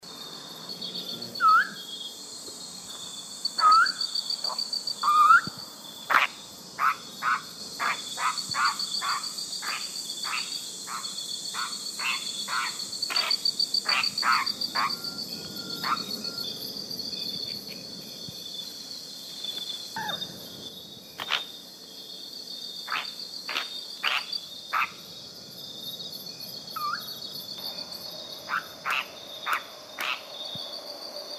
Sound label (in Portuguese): canto do tuju